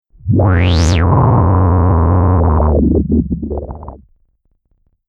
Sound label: Chaotic Electronic Wind, whoosh